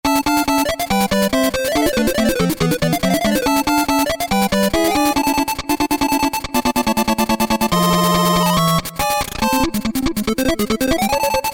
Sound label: Coliseum Battle Critical 8-Bit